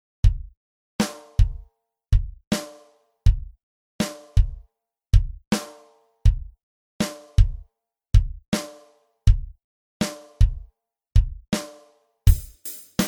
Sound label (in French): batterie; Rythmique 5; Constantes et modifications dans la musique jazz